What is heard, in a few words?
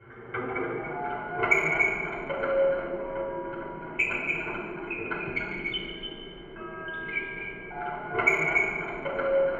Squeak